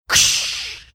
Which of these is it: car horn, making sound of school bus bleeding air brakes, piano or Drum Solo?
making sound of school bus bleeding air brakes